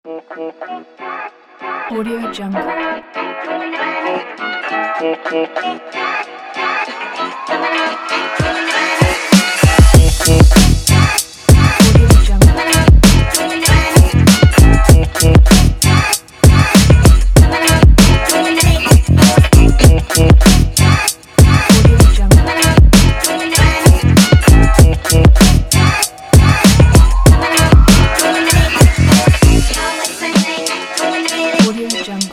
hiphop funk

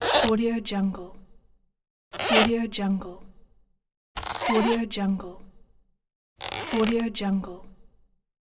floor creak

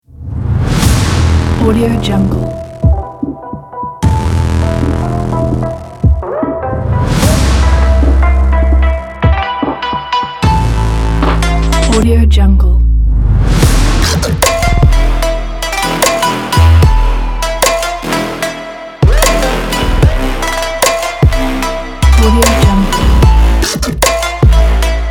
urban trap

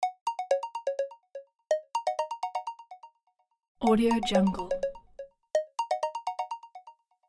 incoming video chat call

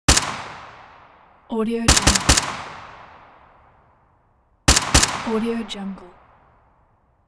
ak47 gun shots

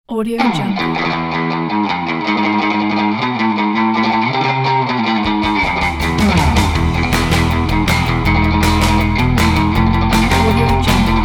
surf version; hava nagila

